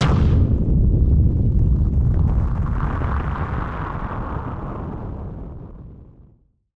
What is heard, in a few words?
Firework FX; musicradar; bomb factory